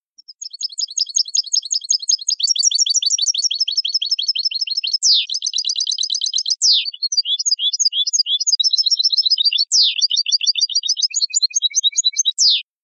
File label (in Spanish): discordantes